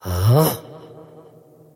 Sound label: wolf attack emote; dota; crystalmaiden